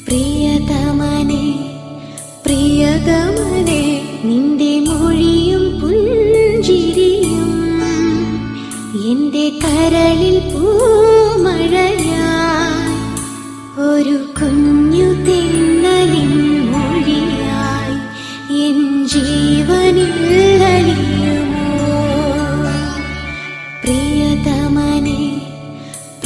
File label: Malayalam Love Song